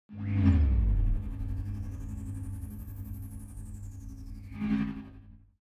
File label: Lasers Sound Effect; iOS 26 Sounds